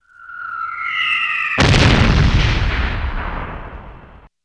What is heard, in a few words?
Bomb Missile Whistl, mortars